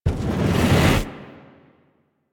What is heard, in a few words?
Operator Focus Blast Fireball Charge; Warframe; Operators; Powers; Attack